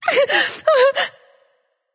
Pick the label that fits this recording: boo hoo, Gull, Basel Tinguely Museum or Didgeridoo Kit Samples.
boo hoo